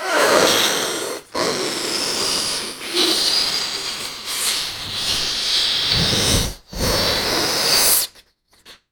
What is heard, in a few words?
balloon being blown up